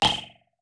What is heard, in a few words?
bop; SFX dw bopmod